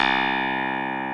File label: Electric Pianos; OMI - Universe of Sounds; EII Factory Library